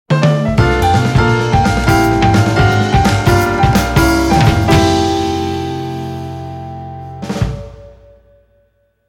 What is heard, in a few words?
Jingle Goal; Gran Turismo HD Concept